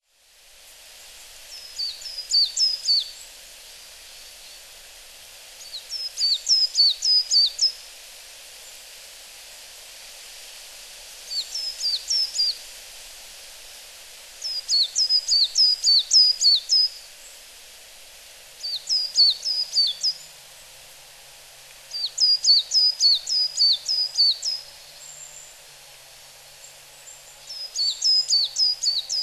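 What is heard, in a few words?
Coal Tit; higara; okayama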